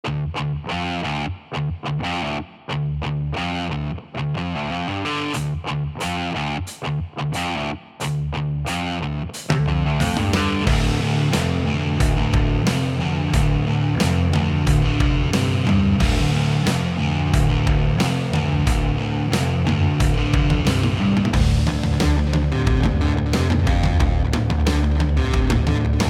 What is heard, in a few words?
Commercial Music Music For Pickup Trucks Loopable